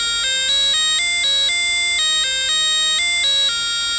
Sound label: win